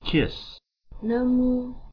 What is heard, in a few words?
kiss